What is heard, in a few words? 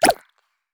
Grab Wall